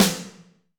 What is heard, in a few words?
Snr Chrome, Alesis D4